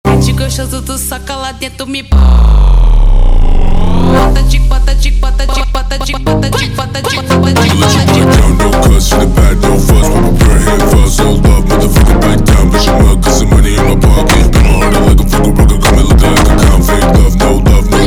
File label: slowed, funk